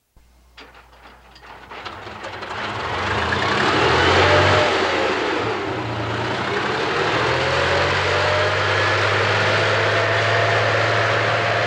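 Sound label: Tank Away U Turn By Stop, Tank Runs On Small Ups, Downs, Hills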